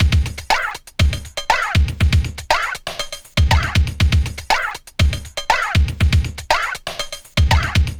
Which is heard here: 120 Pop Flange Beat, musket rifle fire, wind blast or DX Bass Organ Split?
120 Pop Flange Beat